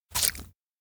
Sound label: pick mushroom eat; anemoi